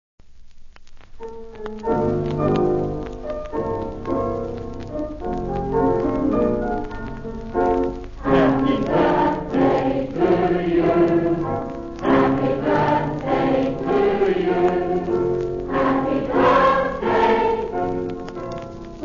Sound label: Happy Birthday Song